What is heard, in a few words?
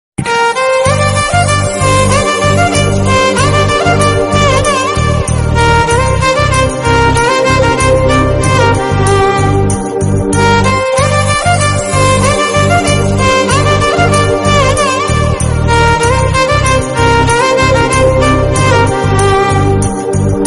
Flute Instrumental, ringtones